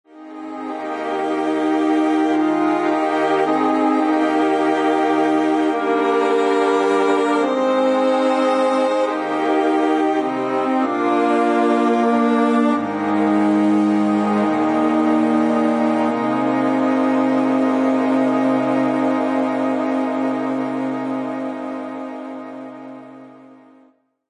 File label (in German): alles hat seine zeit playback